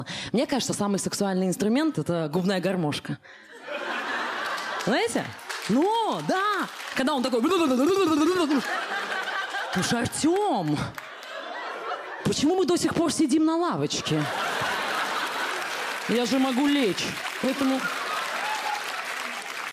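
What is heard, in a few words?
harmonica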